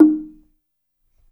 GATO; InVision Interactive; Percussion Slam

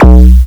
BD, Drums Dance & Groove

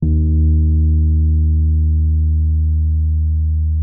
D#2, RHODES; Xsample VOL-1

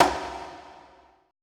BONGO L; BPC DRUMS

